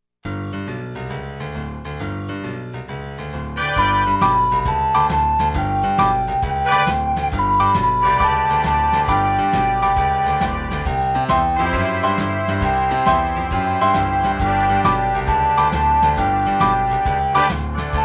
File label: Blues